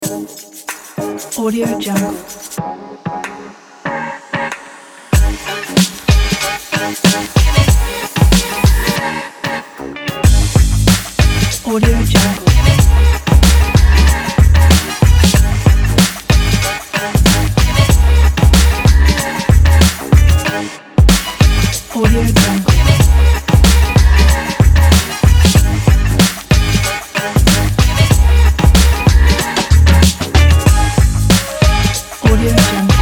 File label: summer hiphop